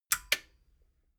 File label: metal button stereo click power off